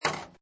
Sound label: Pinball Sound Ball Hit With Vibrations; Video Games; Lynne Music